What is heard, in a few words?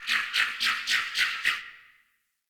Cardinal Decoy Call; Objects; Thawtide